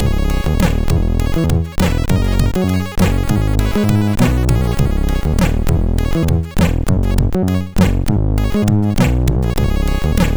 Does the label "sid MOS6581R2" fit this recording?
Yes